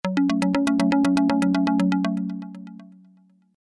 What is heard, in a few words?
Ringtone (Xylo), Melody Loops; TRUTH-HZ; Crowdsourced Sample Pack